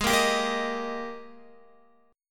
G sharp Major 7th Suspended 2nd G sharp, down, Guitar; strummed; chord